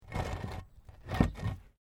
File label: PAVING STONE MOVE, dragging